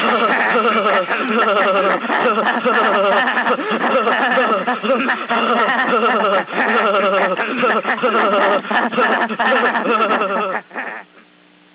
laughter fast

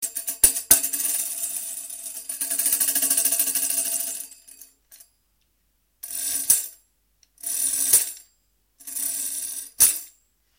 ickle tambourine

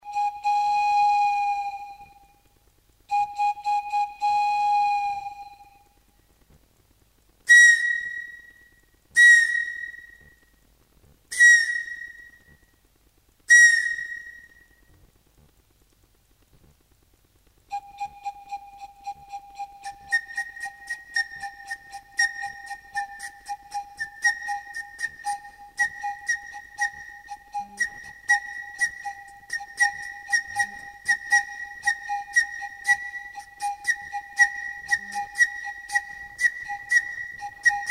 pifilka, ethnic instruments

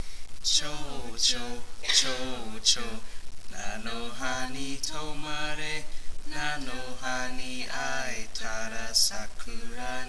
choo choo

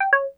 error

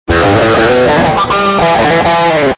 harmonic damp

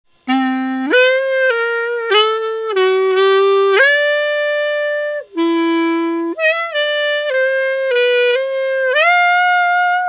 Clarinet

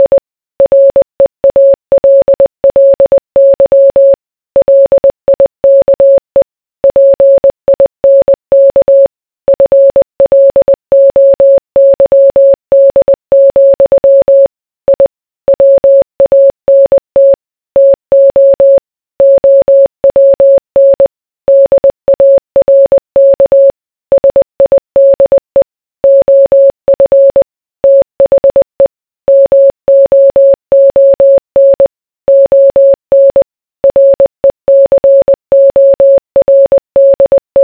morse

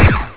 laser

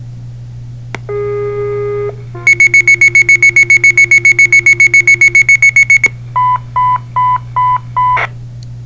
fire pager

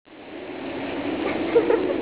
laugh

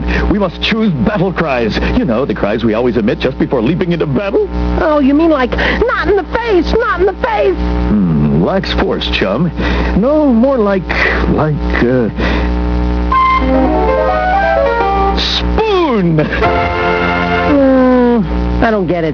Battle

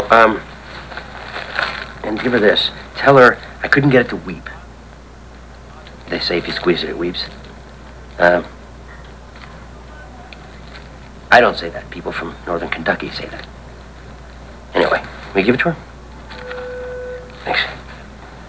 it weeps

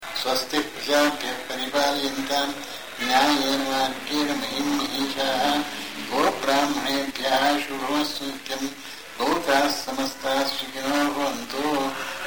mangala mantra; astanga yoga

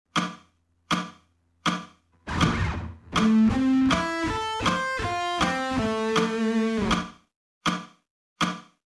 sweep picking

